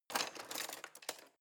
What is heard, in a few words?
knives wood drawer grab hard